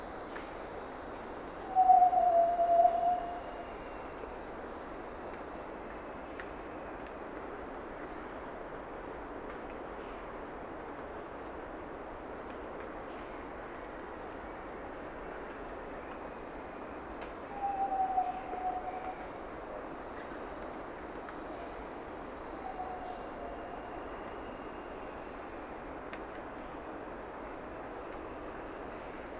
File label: Yellow legged tinamou